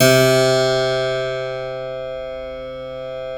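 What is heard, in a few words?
C2 clavinet right, Keyboards Acoustic; USB Soundscan vol.17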